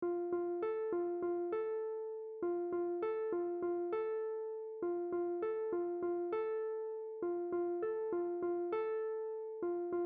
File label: Accomp 100bpm